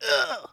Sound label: Pain, Bad Vocals